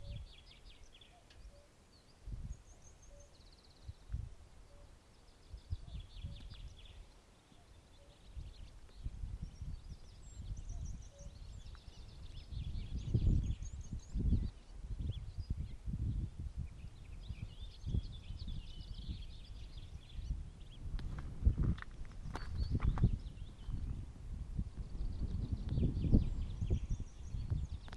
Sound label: cuckoo